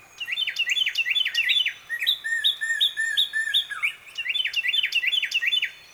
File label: cardinals